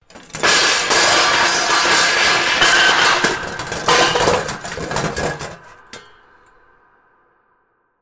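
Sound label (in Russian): поднос с посудой падает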